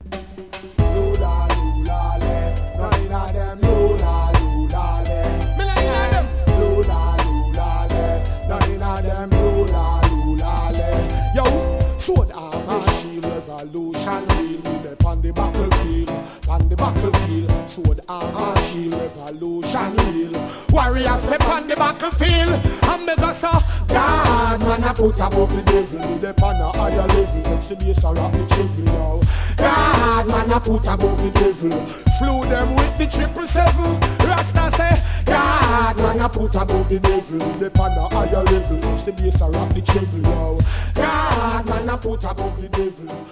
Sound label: meditation riddim